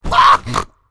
Character Wiz Hit; Angry Birds Epic

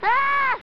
Screams; The Simpsons Bart's Nightmare; SNES

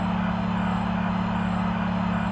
Ambient Security Room, sound effects; Among Us

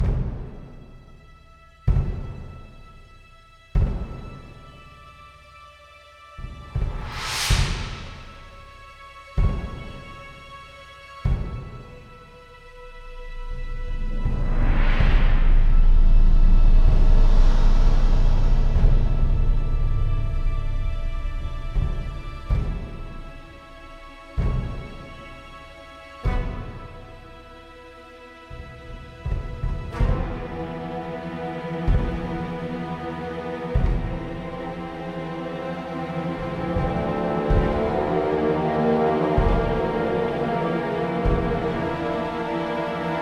Fight Theme Ambient; ryse son of rome 2014

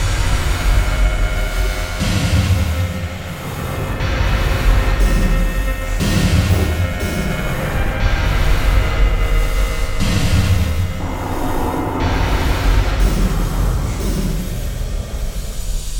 Success Tonal B6; Insanely Twisted Shadow Planet

